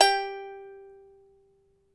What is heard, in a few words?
charango G3; Spectrasonics; Hans Zimmer Guitars VOL-2